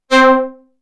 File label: Multiwave Lead